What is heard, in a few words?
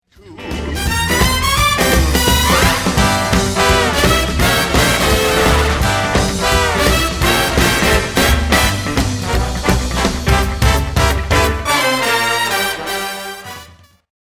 Swing